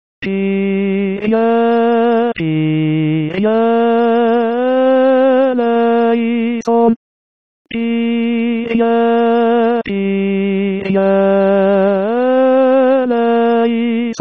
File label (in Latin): Kyrie Missa pro pace TENORS